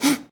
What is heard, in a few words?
Boxing Female Punch Breath